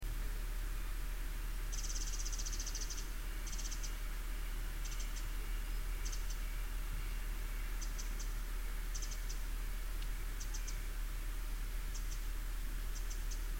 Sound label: winter wren